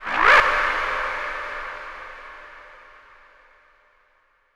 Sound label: Tonal FX A